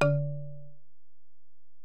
Bell, Alesis DM-PRO

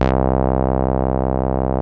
BAS, Eurotech; Sounds Good

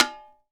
can hit; AKAI S-Series CD-ROM Sound Library VOL-6

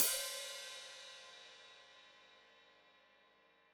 Hat Foot Open, Hi-Hat; Deeply Sampled Drums

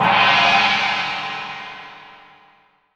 CHIN 20, MALLET CYMBL

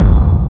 DR. LOOP, DRUMS; X-Static Goldmine 3

